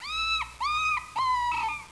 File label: DOG